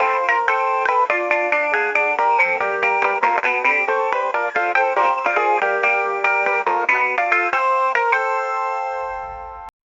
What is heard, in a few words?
40s style music; SFX Engine